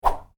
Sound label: Woosh, Whoosh: Short, Light; Whooshes; Sound Design; Stuart Duffield